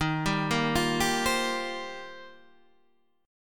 Eb 6th Add 9th, Guitar, Half Step Down

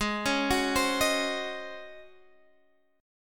C sharp Major 7th Suspended 2nd Suspended 4th

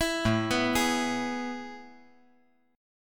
A Major 7th Suspended 2nd, chord

